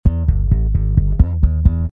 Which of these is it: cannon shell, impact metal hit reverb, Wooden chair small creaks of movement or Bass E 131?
Bass E 131